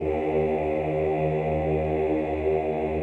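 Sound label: choir ES1, Ooh; Ooh + Ooh 2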